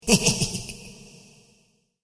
Laughing Silly Snicker With Reverb; Laughter

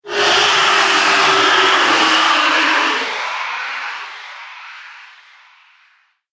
Screaming Ghost Roar; Ghost Sound Effects; horror sound effects

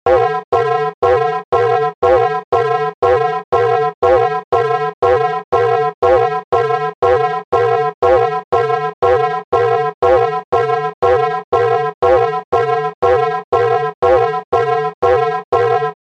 Alarm Loop; electronic

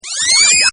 LASER; Laser Blast; Partners In Rhyme

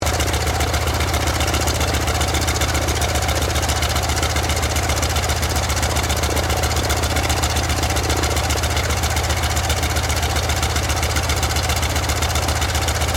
AIRCRAFT CAC WIRRAWAY IDLE

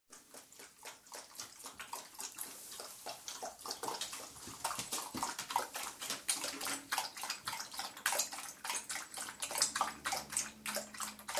Beagle drinking water (Interior); Beagles; Dog; Animals; BBC

